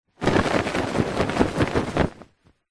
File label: foley clothing shirt shake